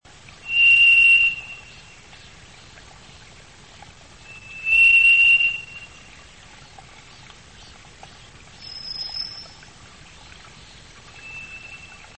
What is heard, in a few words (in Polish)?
drozd pstry; Zoothera dauma; wroblowe; turdidae; żyje w Azji